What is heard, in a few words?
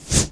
whoosh